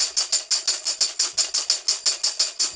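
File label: TAMB, tambourine; Drumdrops In Dub VOL-1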